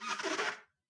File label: clean